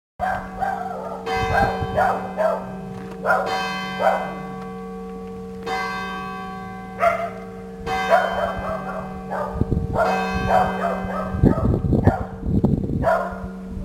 12 COUPS MIDI; VANNES LE CHATEL 54

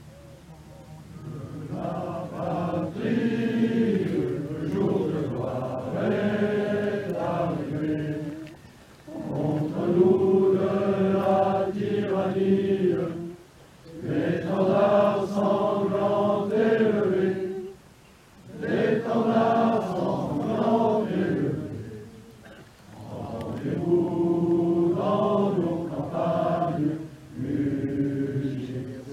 MARSEILLAISE CHANTEE; ROM 79

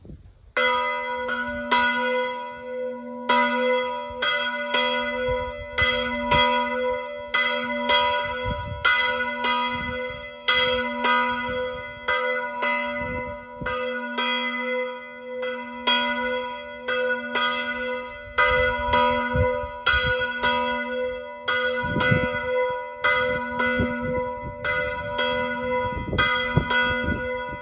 CLOCHE ARRIVEE; SAINT GENARD 79; sonnerie de cloche à l'arrivée de Mr Yvon

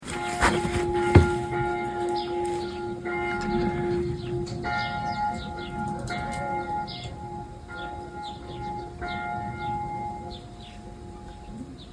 CLOCHE DU MARIAGE, EGLISE; LUSSERAY 79; EGLISE SAINT GEORGES

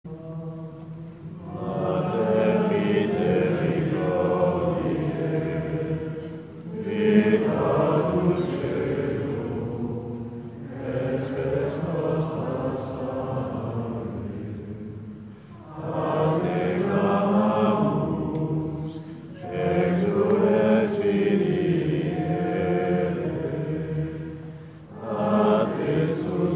SALVE REGINA

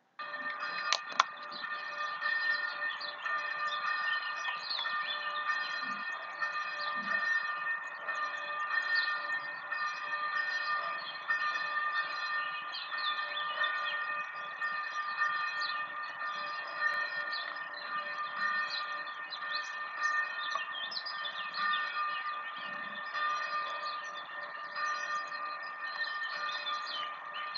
RAMEAUX CLOCHE EGLISE; PAIZAY LE TORT

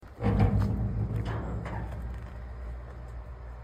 PORTAIL ENTREE, BRUITS DE PORTAILS; BREUIL COIFFAULT 79

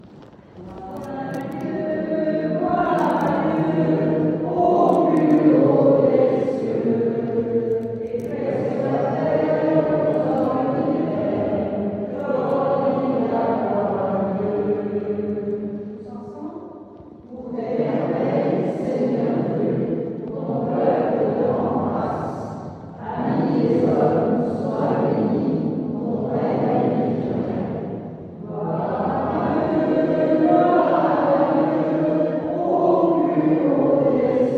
GLOIRE A DIEU, EGLISE; LOIZE 79; PENTECOTE

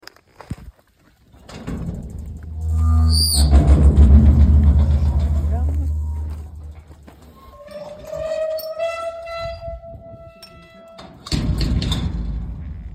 le portail bruits; VERRINES SOUS CELLES 79

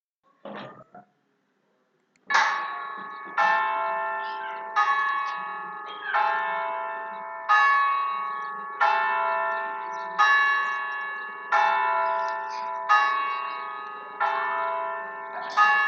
GLAS

